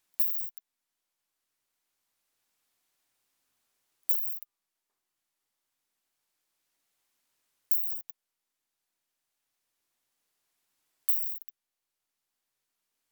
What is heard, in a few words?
Isophya modestior